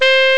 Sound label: saxophone; Amiga Soundtracker Sample Packs